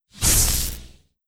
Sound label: Electric